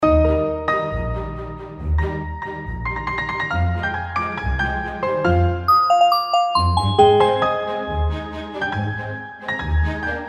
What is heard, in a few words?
ringtong; Gionee V185